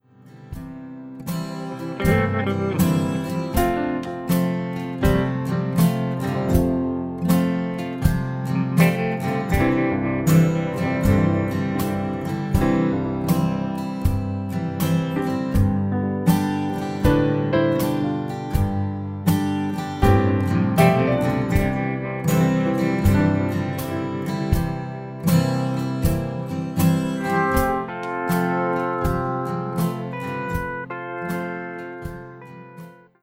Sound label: Bluezy Country Waltz